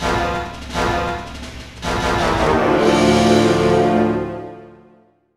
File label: Jingle Failure